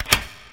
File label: door; shrdlu; aventura4